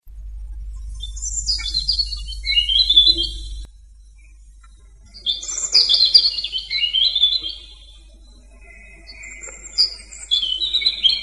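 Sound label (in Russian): zarjanka, robin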